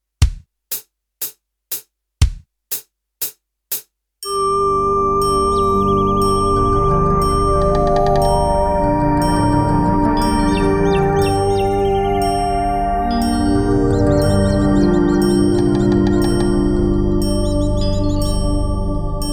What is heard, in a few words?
recorder rainforest performance